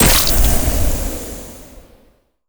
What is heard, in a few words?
voidspawn missile; Stellaris; distant stars